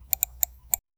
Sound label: computer mouse click, office